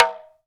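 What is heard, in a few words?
PRC talking drum, Africa; Roland LCDP14 Africa VOL-2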